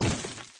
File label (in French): explosion bloque; dot adventure